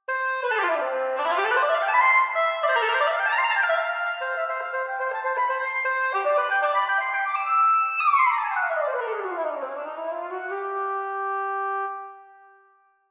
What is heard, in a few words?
oboe; orchester mit samples